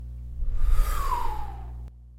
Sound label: Woosh